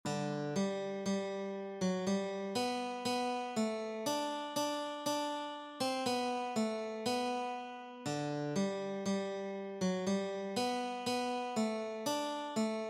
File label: Hark the Herald angels sing Guitar